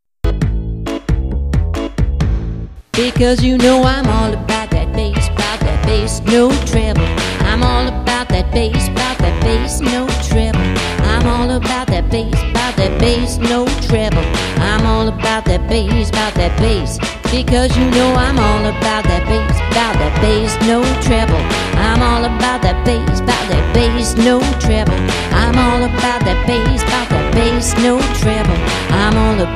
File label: all about bass